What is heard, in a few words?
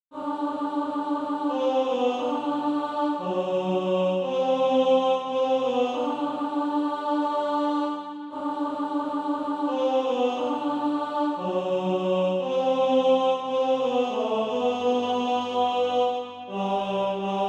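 tenor, SATB Practise Music; Christ the Lord Is Risen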